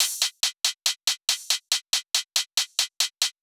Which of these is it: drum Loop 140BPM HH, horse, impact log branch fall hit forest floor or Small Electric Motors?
drum Loop 140BPM HH